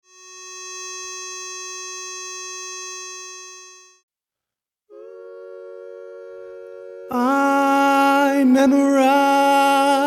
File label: Bass Predominant